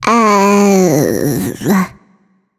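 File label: nonverbal seriously; smite; voicepack; izanami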